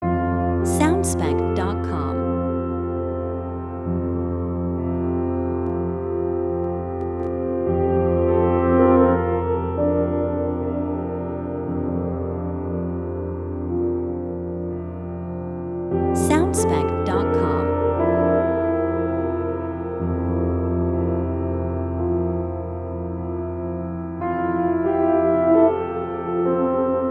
Reversible Piano

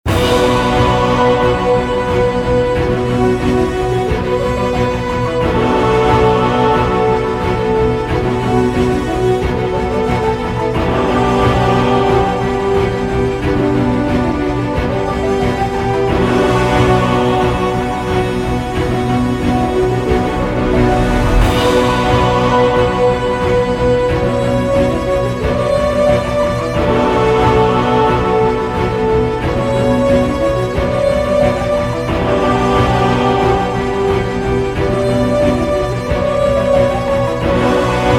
Power of Darkness Loop

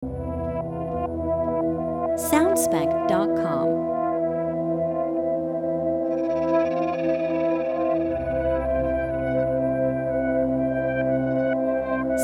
Dark And Mystic Short Loop

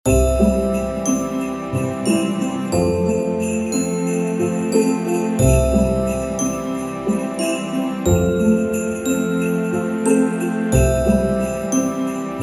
Christmas Music On Hold

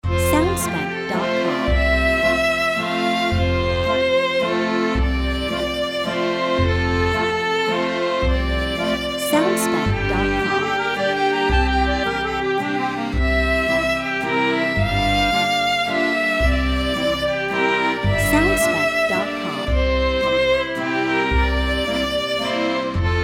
France Romance Loop

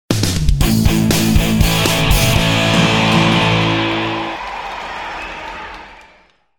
Rock The Logo with sports FX

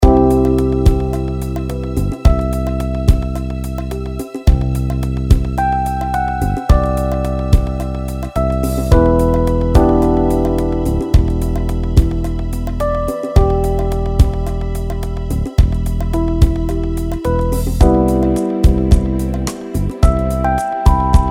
Basic Background Loop

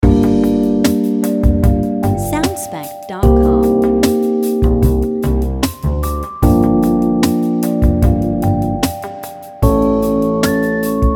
City By Night Menu Loop

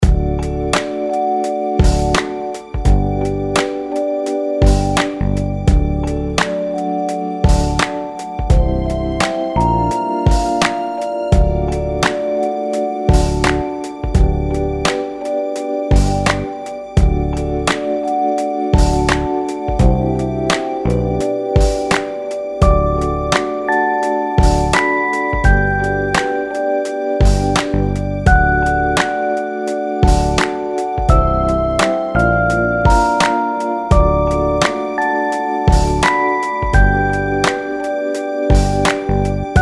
Neutral Pop Beat B Lite Loop Version

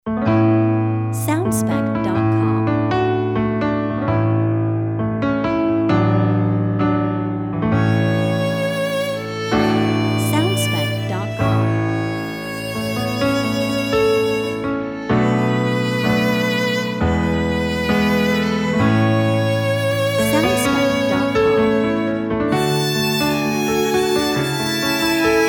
Sweet Violin Tune

